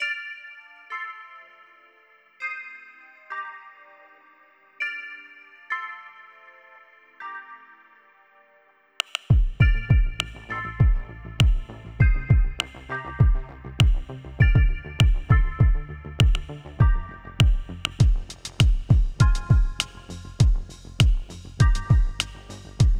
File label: Music Credits; Kill to Collect; windows gamerip 2016